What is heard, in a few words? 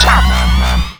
Car Horn; Hyperdrive Massacre; GZI One